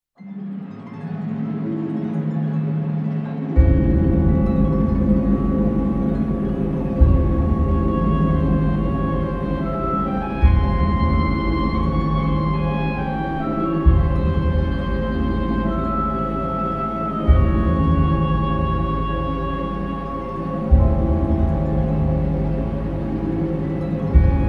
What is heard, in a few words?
oboe